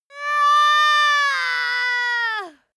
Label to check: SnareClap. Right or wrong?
Wrong